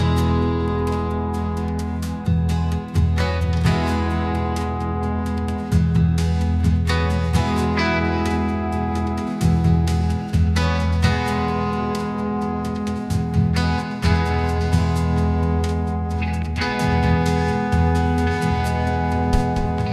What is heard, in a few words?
ringtone country